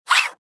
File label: launch